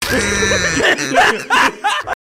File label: sophisticated wheeze